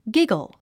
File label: giggle